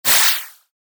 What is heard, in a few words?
acceleration